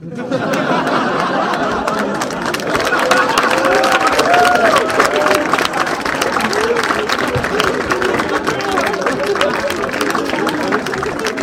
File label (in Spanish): aplausos con risas